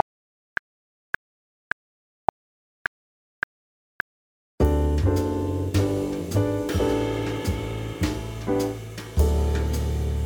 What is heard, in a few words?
105BPM Backing Track